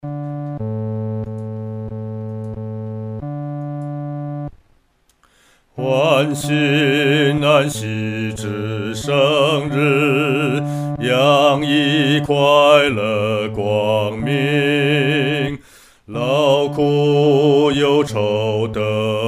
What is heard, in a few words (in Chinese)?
独唱 第四声